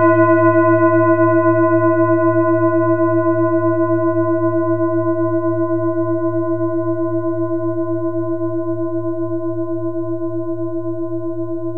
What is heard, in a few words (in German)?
bengalen planetentonschale